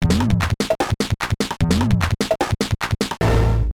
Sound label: Solo Dance; Tempo (32X); Sega; Megadrive-Genesis